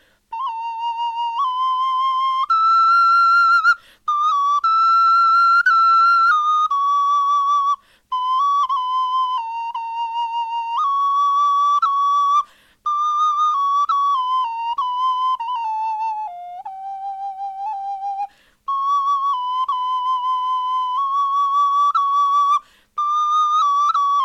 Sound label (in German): melodie